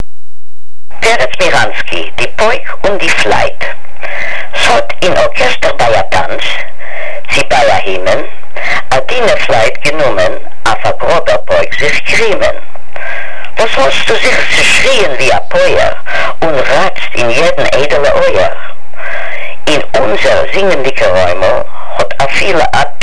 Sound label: drum flute